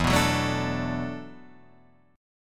E 7th Suspended 2nd Suspended 4th, down, Guitar, Open F; E7sus2sus4 strummed; JGuitar